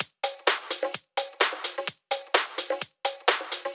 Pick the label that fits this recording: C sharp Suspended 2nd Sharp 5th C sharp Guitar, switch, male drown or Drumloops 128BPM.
Drumloops 128BPM